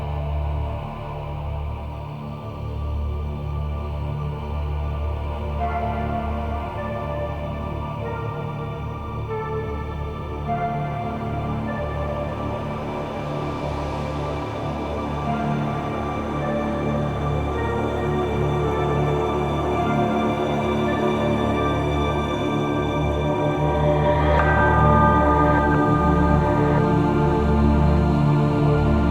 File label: Nursery Front Music Mix; Warframe; Cinematics; Second Dream; Operator